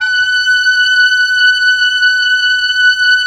Oboe f; Roland - LA Composer vol. 4

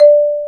Bass Marimba

